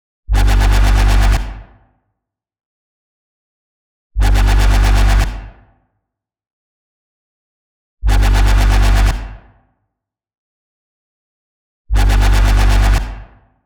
124 G Bass, Complex Electro Synths; Prime Loops; Elements

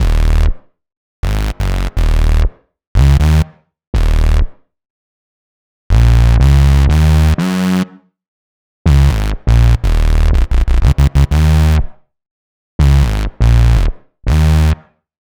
122 D#m Bass, Complex Electro Synths; Prime Loops; Invader

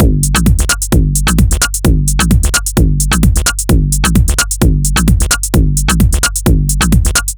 Digital Breaks, 130 BPM; loops; Loopmasters; Jon Carter & Alex Blanco; Block Party Essentials

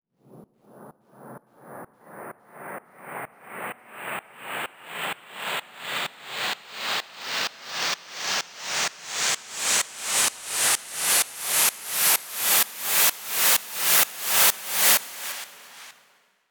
FX Uplifter

